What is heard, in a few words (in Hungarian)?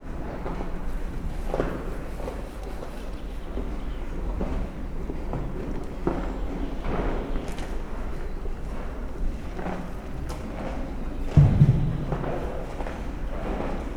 eszik a zsiráf; szegedi zoo 2011